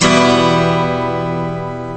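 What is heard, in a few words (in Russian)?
гитара аккорд ми минор Em восходящий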